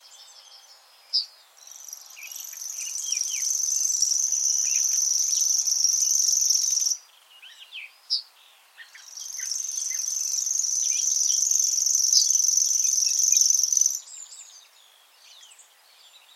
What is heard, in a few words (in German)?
feldschwirl